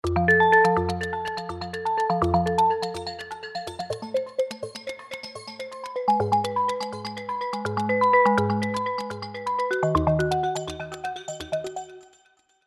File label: Tape Glass; Heavyocity Mosaic; Philips